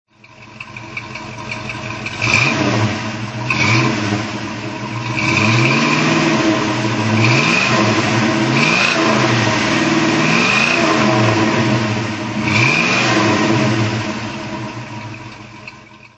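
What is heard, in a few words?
bmw 503 throttle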